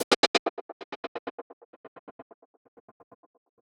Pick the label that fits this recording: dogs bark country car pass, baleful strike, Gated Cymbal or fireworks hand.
Gated Cymbal